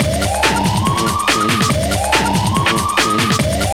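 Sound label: drum loop; X-Static Goldmine 3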